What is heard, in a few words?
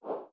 swipe left; GoogleVR; ScrollingUIDemo